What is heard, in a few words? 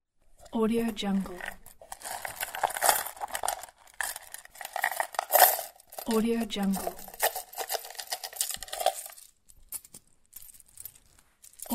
box with pennies